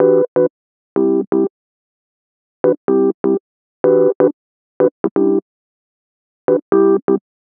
125 Rhodes Dom E, Keys, Nu Jazz House; PowerFX - Nu Jazz House

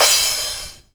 CRASH, Dance Mega Drums; Best Service

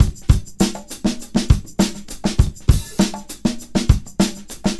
Combo, R&B Drums

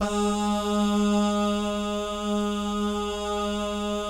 BAH GUY, BAHS GUYS; Spectrasonics; Vocal Planet; Jazz FX

